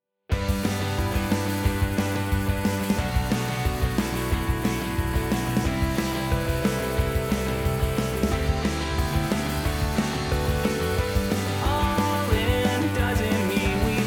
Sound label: forest strings